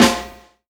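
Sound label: snare drum funk; E-Lab; X Static Goldmine 2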